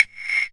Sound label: GATE CLAVE, Gated Reverse Slapback Kit; drums; STYLE KITS (Hip Hop, Etc)